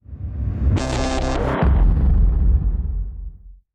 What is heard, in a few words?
Booming Reverse